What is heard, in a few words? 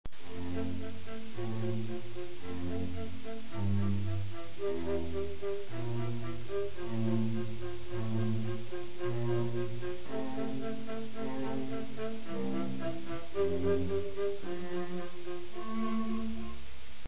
cello viola; mozart; musica classica